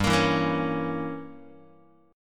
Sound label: G Diminished 7th Guitar, Gdim7 chord; strummed; JGuitar